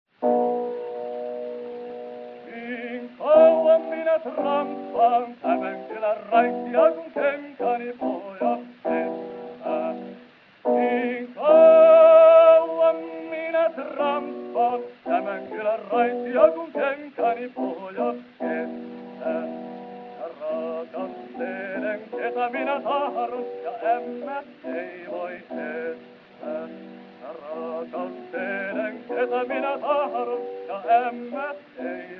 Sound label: Gramophone